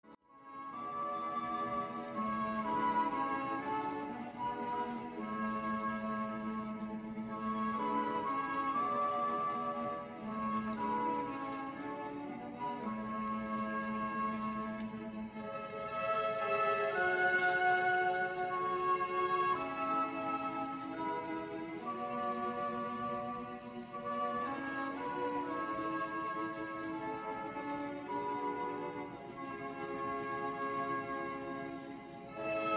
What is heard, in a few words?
Be Still My Soul orchestral; hymns